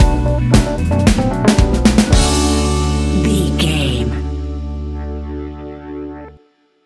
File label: glamour disco stinger, A fast paced piece of electronic dance music perfect for the nightclub